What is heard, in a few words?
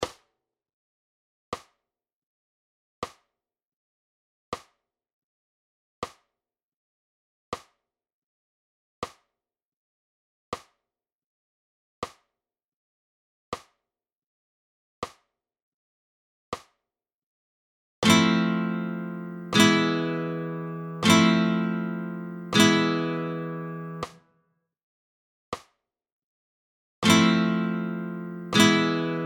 Alzapua